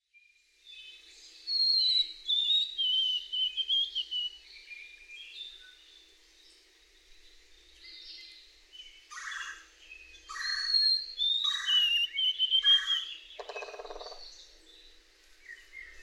White-throated Sparrow, birdsong

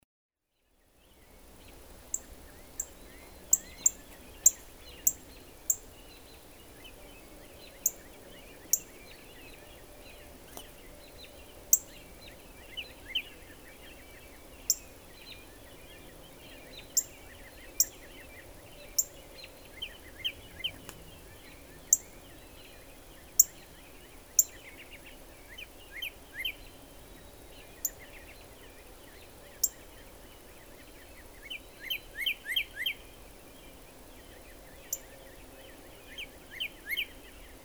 Northern Cardinal

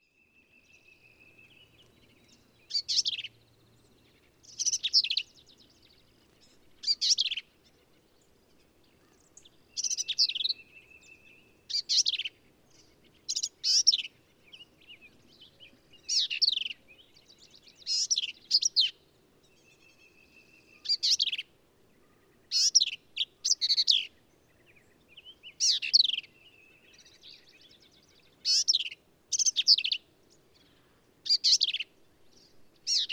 Black-capped Vireo

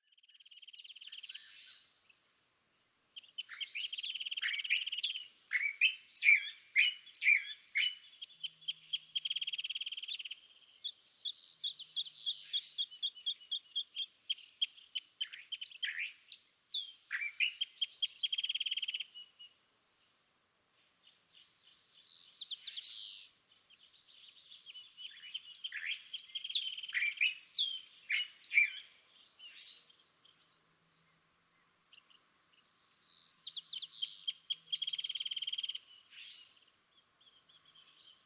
California Thrasher

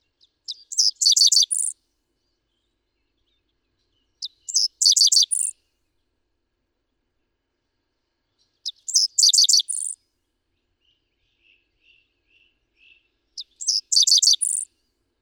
Dickcissel

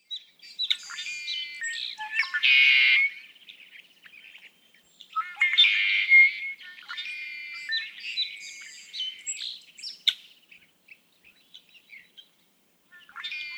Red-winged Blackbird

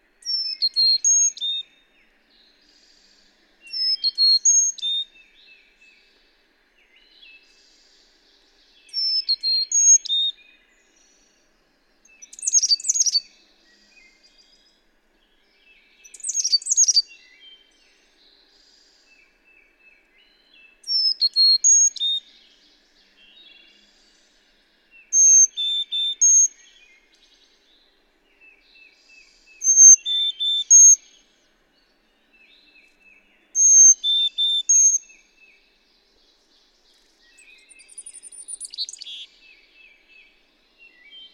Carolina Chickadee

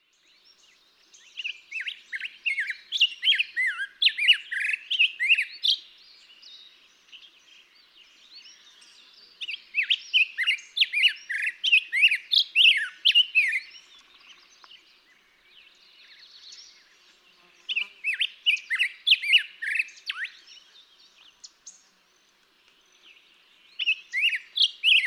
Black-headed Grosbeak; Daytime singing, example 2. May 17, 2016. Mt. Graham, Arizona.

